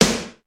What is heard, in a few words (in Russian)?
malij baraban odinochnij zvonkij blizkij